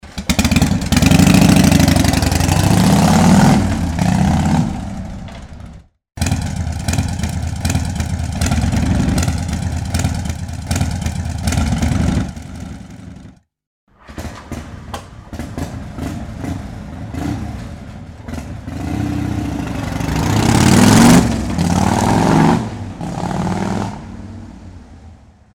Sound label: motorcycle harley starting